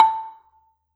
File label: Gambang Hard Beater, Gamelan